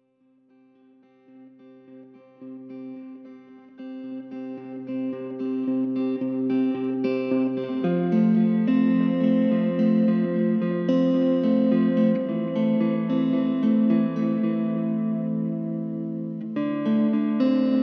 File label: Guitar